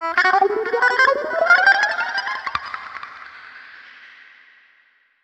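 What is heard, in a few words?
GIT-FX, guitar, Disco; Best Service ProSamples vol.48 - Disco Fever; AKAI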